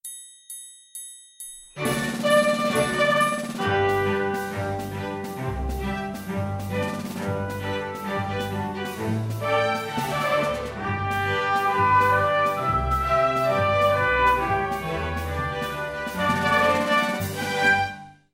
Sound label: march